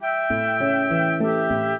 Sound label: trio, flute harp; Mozart